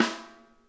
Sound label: RIM, snare; Sonic Reality; Interactive Drum Kits